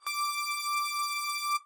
lead

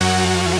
synth

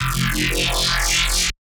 wobble

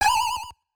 tweet; 主播女孩重度依赖

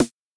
snare roll

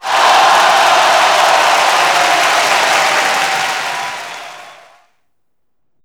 MAP SONG CLEAR; maimai

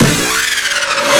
breakbeat

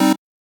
Chord

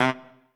Trumpet melo

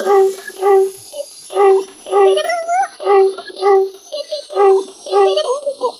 vocal main loop middle; 主播女孩重度依赖

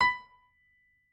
piano

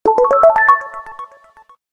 Stepping stones, notifications; 三星

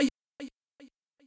voice

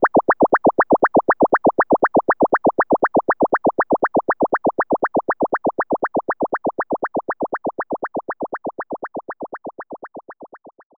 MAP MOVE WALK; maimai

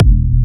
Kick

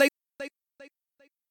voice

